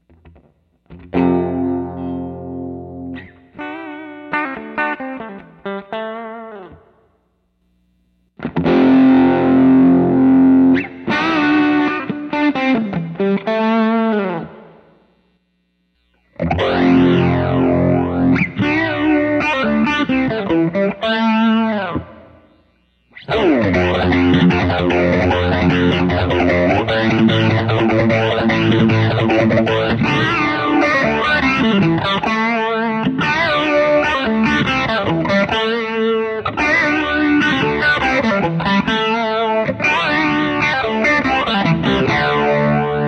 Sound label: britannia, Gain 17, Treble 7, Bass 15, Brilliance 17